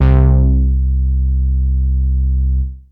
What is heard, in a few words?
Bass Synths hits and octaves